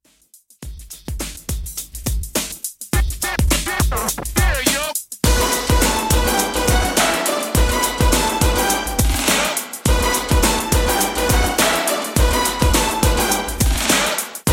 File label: 80s Classic Redrum 104 Bpm Clean